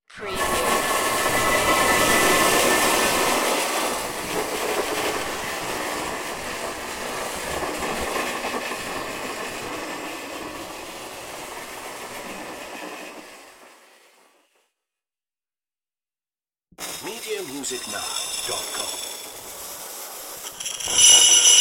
electric sizzle bursts

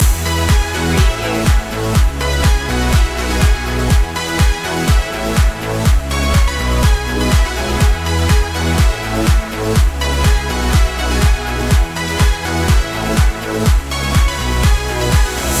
creamsicle loop

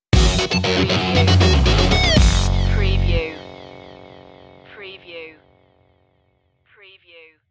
funk stinger

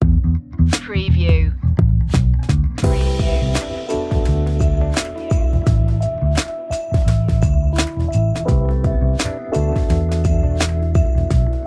sweet flavor chillout

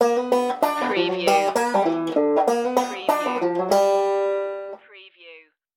catfishin stinger

